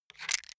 makeup box pick up, badezimmer; haus-garten

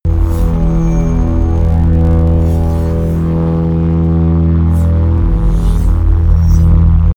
promo pad

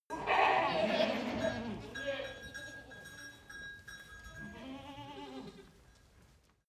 goat baa herd excitement; natur tiere; ziegen

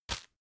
tissue grab hard; objekte; taschentuch

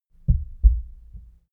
heart beat double calm; comedy-cartoon; koerpergeraeusche

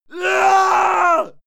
male fight scream desperate; schreie; menschen; soundpool

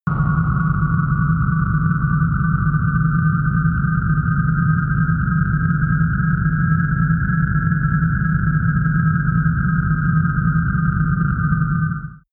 space distant rise; raumschiff

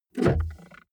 car bmw e46 interior center console open soft

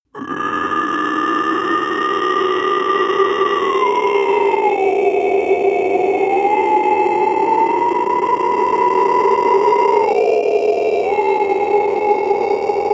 male inhale grunt; koerpergeraeusche; menschen; soundpool

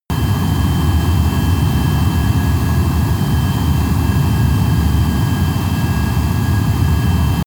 military jet bomber turbine steady tonal drone; verkehr transport; Airplanes - Jets

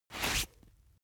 boxing gloves slip off fast; sport freizeit; boxen

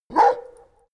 labrador bark echo short; natur-tiere; hunde

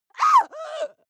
female lunatic scream hysterical short; schreie; menschen

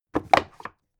book shelve very hard, wohnen

